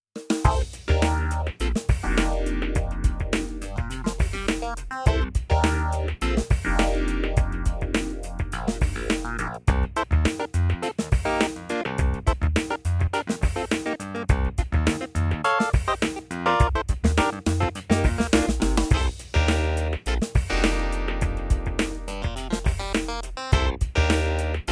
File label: Clav